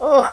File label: ooff; Worms Armageddon; Finnish